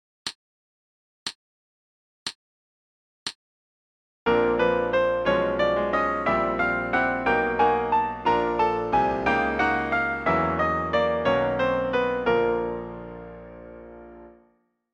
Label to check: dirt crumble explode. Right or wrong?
Wrong